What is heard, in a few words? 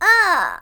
Fem Die; FlyForInsanity